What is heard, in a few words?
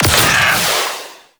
Space Fighter Dredger Weapon Fire; Rail Jack; Enemy Ships; Grineer; Agent